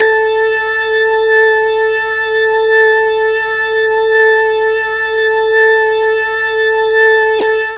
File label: A3 organ, MKII mellotron